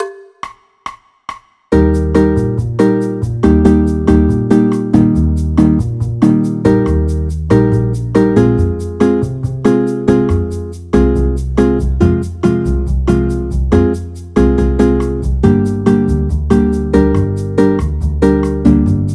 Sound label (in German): eb dur stufentonleitern